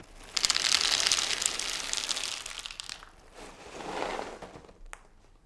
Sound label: Cereal Pour Into Plastic